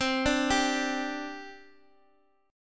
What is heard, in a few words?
G 5th C, chord